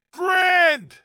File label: coin grand; coinrain